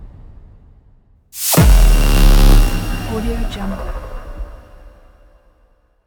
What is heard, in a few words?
scifi synth hit; sinematic 2; soundmorph